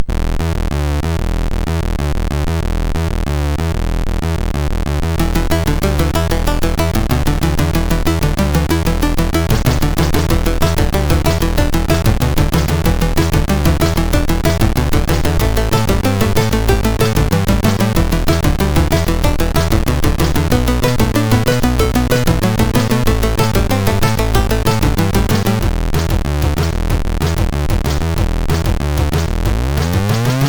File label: Scratch n, MOS6581R2